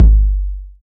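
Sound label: 808 distorted kick; Drums In Your FACE!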